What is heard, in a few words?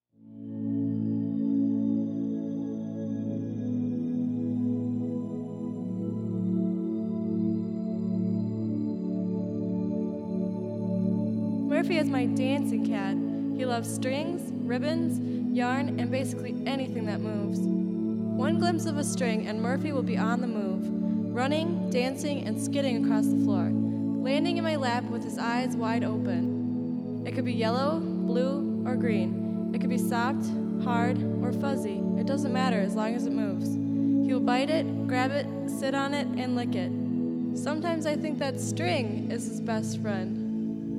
string